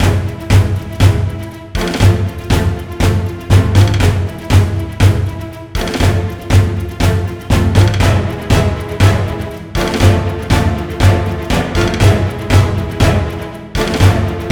matador boga guresi; By Burak Sound Bank; matador tema vol 1

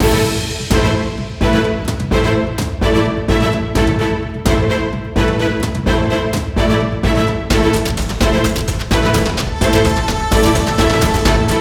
haber; By Burak Sound Bank; haberler news

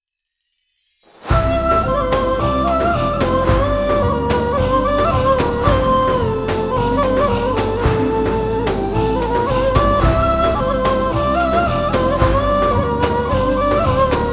tasavvuf ney; By Burak Sound Bank; tasavvuf ilahi vol 3 asktan yana

